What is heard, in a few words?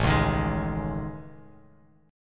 A Major Sharp 11th, Bass Standard; AM#11; strummed; JGuitar